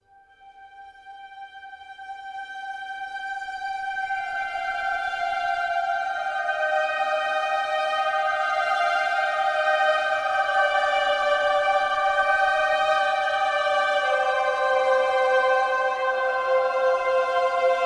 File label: Angels Ambient